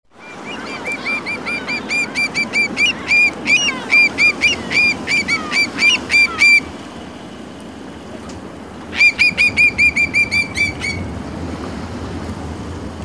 zarapito trinador

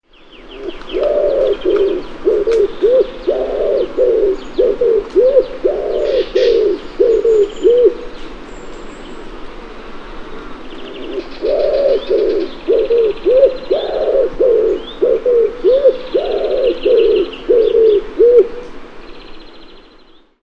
paloma torcaz

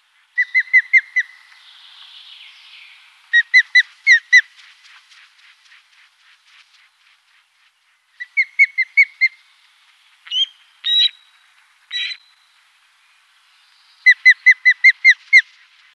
aguila pescadora

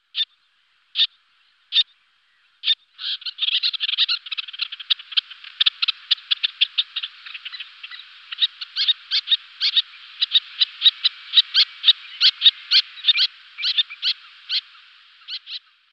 charrancito comun

